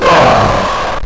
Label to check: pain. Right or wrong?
Right